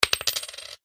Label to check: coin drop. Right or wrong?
Right